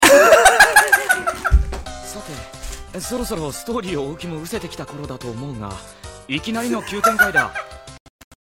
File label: puajajajaja